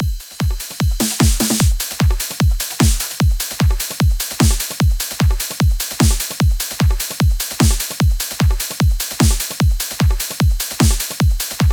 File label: Tutorial Click Short 7 bar